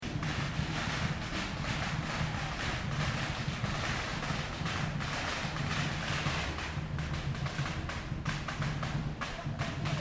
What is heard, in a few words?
Ambience Melbourne Chinese New Year Fireworks Drums Short; Chinese new year 2009; Concert Sound Effects; Foundation1